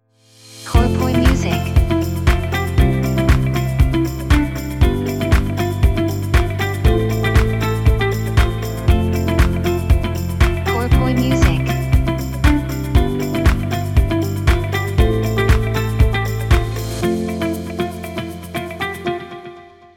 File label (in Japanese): jingle ウォーター